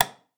Light Switch On

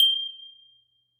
Wind Chimes Single; HauntedBloodlines; SFX Producer

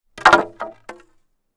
Wood Drop Tent Poles Fall; Props; SFX Producer; HauntedBloodlines

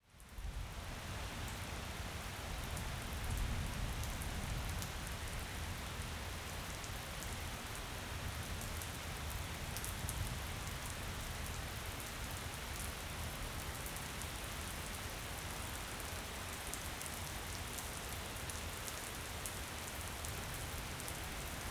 Thunderstorm Light

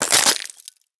Footsteps Glasses; Haunted Bloodlines; SFX Producer

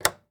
Radio Flip Switch

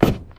STEPS Wood, Hollow, Walk; High Quality Footsteps

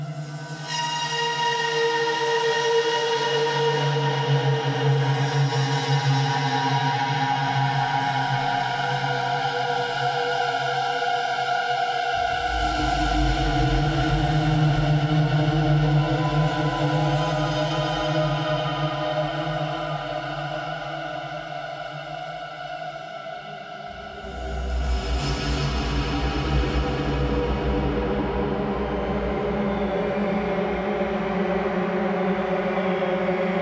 Screech, Horror Atmospheres Music; Miasma - Horror Atmospheres Music Pack

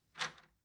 Door Knob Grab; MinorHallutinationsSounds1

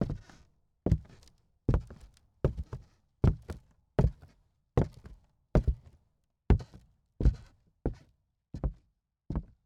Walk Wood Creaks; Footsteps; HauntedBloodlines

